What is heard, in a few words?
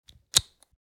Headphone jack remove; headphones and speakers